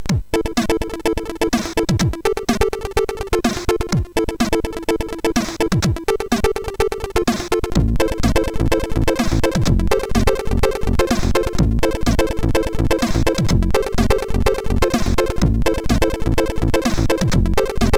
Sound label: Crackintro, MOS6581R4; hvsc